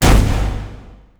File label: bazooka fire